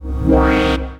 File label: FX Alarm; Vengeance Samples